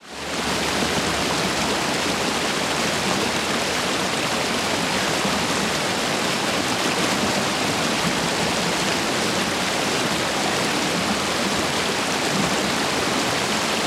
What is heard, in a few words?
submarines engines; World Of Warships